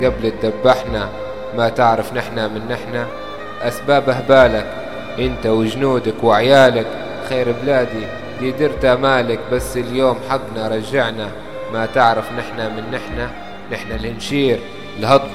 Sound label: libyan free music; reggae